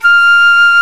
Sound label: F5 FLUTE; ORCH.COMP